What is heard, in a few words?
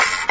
spring; Junkbot